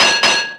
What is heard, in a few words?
Blacksmith; Etrian Odyssey III HD